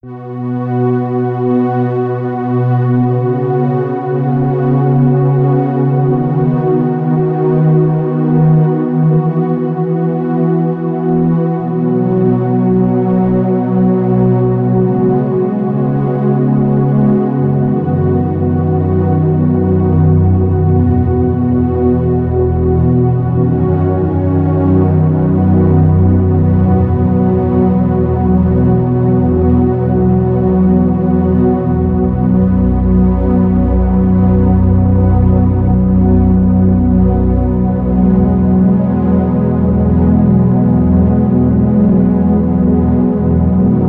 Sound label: Underscore PAD; Worship Flow